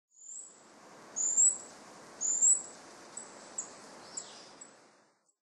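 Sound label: Eurasian Treecreeper; kibashiri; asahikawa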